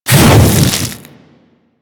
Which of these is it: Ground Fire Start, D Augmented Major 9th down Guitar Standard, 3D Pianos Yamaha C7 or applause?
Ground Fire Start